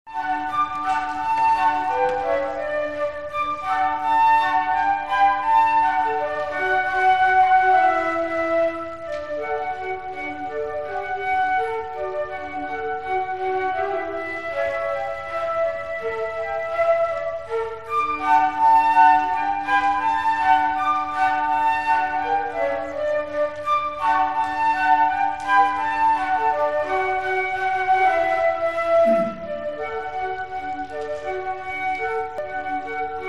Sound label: Flute Trio